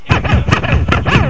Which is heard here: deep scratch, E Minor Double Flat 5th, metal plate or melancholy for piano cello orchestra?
deep scratch